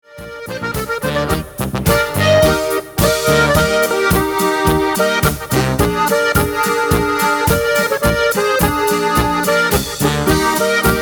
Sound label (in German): tyroler marsch